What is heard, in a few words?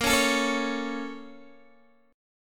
A sharp Minor Major 7th, down, Guitar, DADGAD